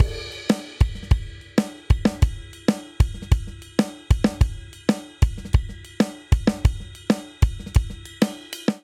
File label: Simple Seven Chorus 7/8 190 bpm, drum; Ableton; Factory Packs; Drum Booth